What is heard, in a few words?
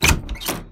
Door Open